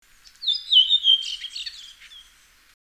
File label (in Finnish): Turili, Karakallio. Alkuosa on oikeastaan kolmitavuinen ja voimakkaasti laskeva.; Espoon - Helsingin raja-alueilta alkukesästä 2008; Linnut